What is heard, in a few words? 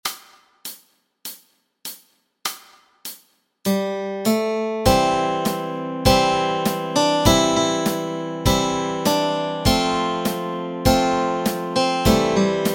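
melody and chords, Easy Guitar and Ukulele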